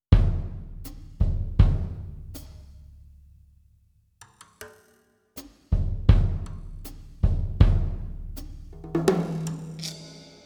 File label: careful move drums, solo drum; KRR 153 SOLO DRUM TRACKS